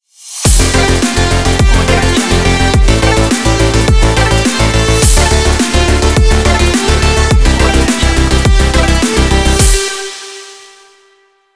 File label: energy dynamic breakbeat logo